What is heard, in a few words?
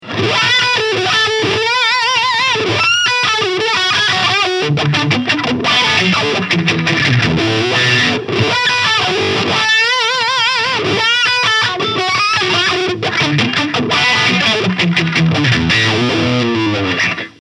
Morley MTLW2 Lead Wah Boost Lead